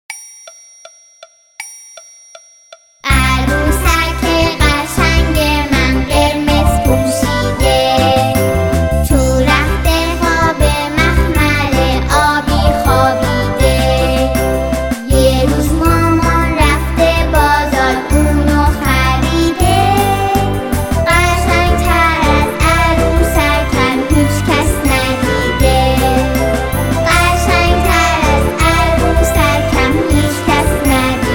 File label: Vocal By Group